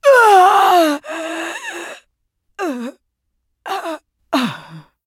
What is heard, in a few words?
death